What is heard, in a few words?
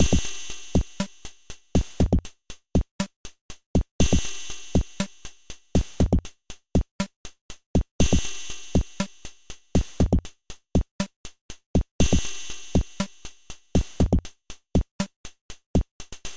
reverb